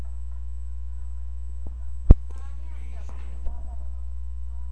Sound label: rain